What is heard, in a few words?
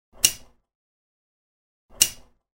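clothespin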